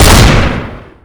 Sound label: jackhammer; garrysmod